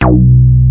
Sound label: Moog Bass Hit